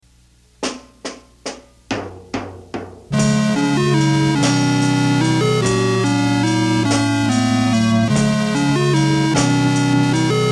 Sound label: Arp Tune; TEAC; This was from high school. I had borrowed an ARP Odyssey synth from the / local junior college along with some kind of stereo reel to reel.